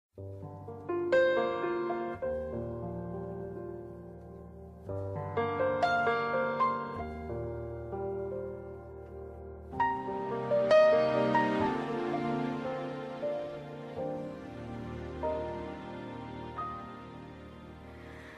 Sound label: Crying Piano